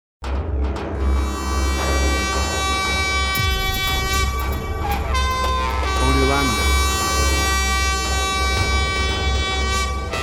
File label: ethnic winds original